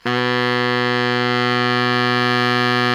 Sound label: BARI MF B 1, Sax; Giga Samples Collection; BARI QUICK